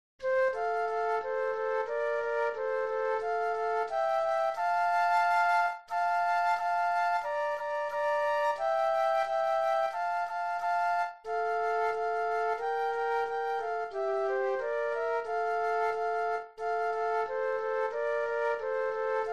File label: Flute Duet